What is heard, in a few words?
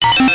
science fiction retro vintage robot beep; zapsplat